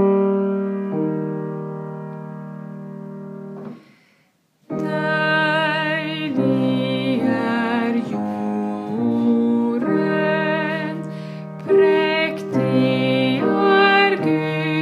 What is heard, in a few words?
Bass